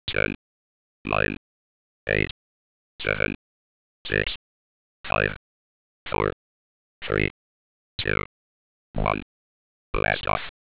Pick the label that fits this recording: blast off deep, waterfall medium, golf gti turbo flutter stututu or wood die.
blast off deep